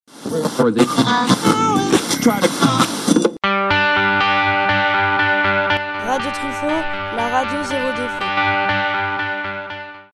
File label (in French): jingle